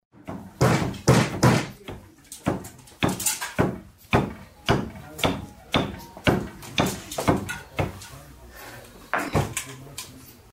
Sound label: carpet weaving; قالی بافی